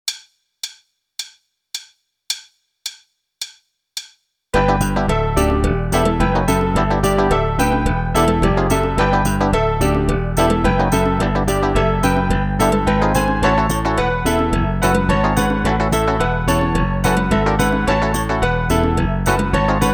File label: Off Beat HiHat; Meespeel CD